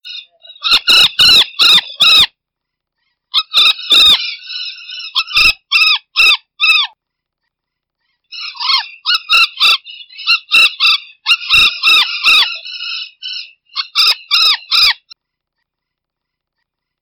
Canto da Ararajuba

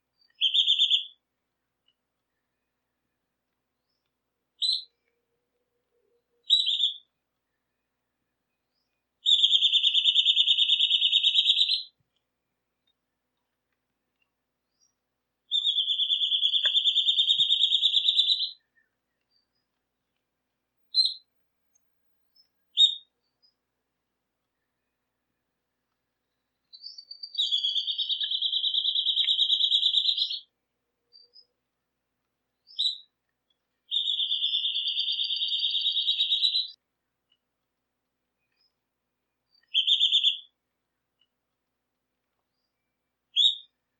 Canto do chupa dente

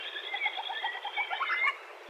Canto da tesourinha da mata

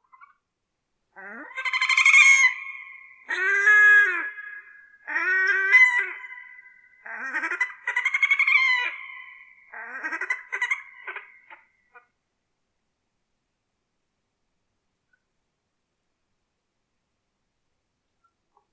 Canto do mergulhão de pescoço ruivo

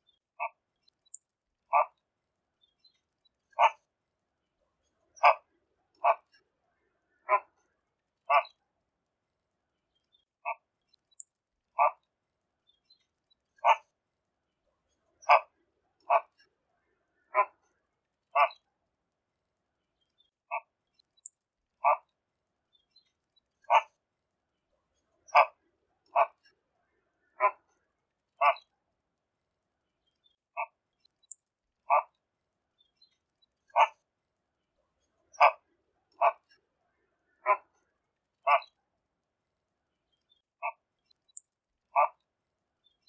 Canto do flamingo americano

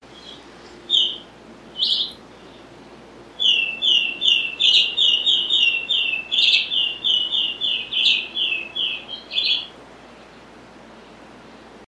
Canto do flautim

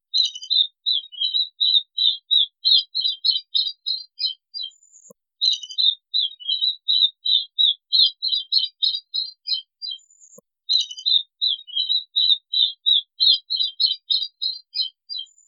Canto do taperucu de coleira branca

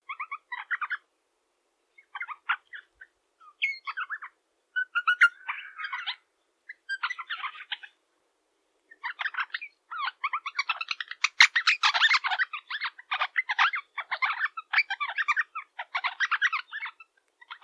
Canto da rosela eximius

